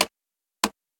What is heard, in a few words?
Wheel click low